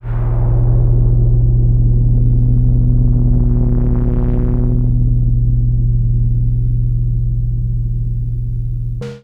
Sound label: CHORD PAD Rumble Mud; Dune Valley